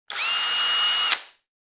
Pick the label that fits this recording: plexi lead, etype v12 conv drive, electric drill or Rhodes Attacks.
electric drill